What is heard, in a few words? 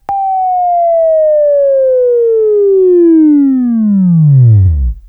Buzz; Labview-Program; Sweeping Training program